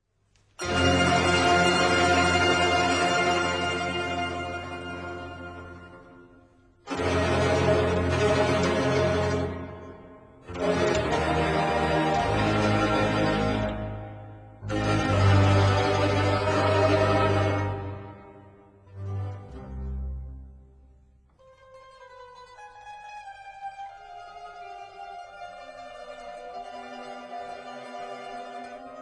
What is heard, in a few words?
mandolin